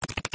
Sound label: Deal Hand